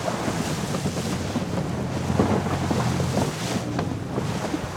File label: Auto Tires Water Loop; Automobiles